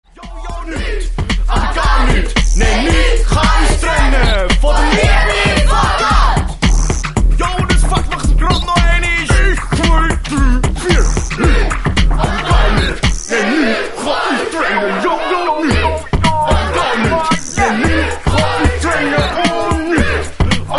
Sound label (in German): rap